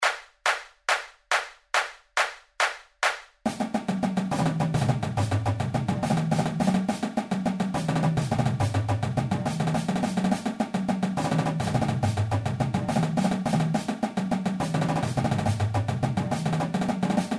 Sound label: Triplet Seq 140